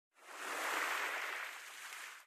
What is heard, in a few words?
swim